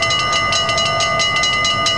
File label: med train bell; KinderGrid Demo